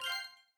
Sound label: drop low white